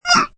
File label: Grunt Closed, Grunts, groans, impact, female; Groaning; Voice Box